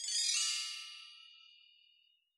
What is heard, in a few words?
bell tree, Yamaha DJX Rock Kit; 144 Rock Kit